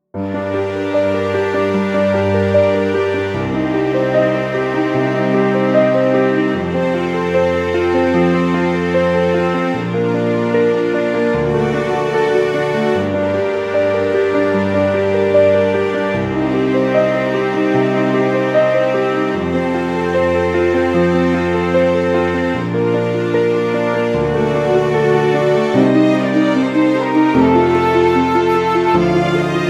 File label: dramatic; by burak sound bank; dramatic dram vol 15